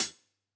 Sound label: close Cl Hat, drum